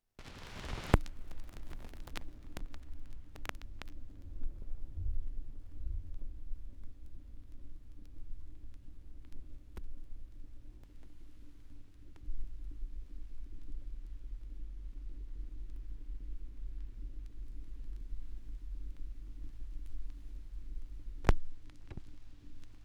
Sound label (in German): Schallplattengeraeusche